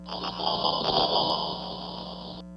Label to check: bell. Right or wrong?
Right